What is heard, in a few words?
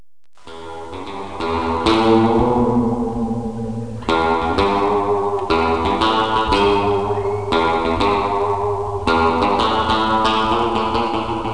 space; The Psychedelic Bus of Dead Knowledge